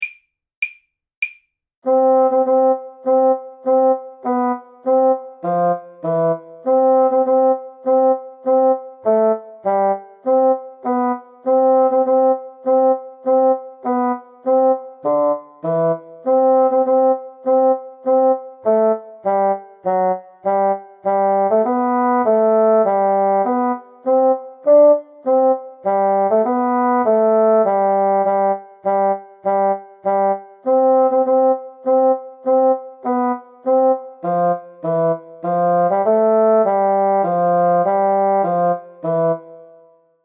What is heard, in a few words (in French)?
marche soldats trio, basson